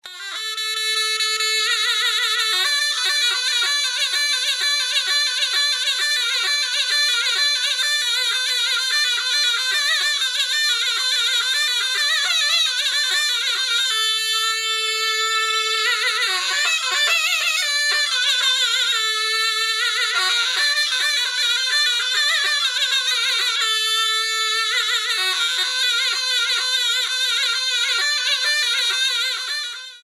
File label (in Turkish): Zurna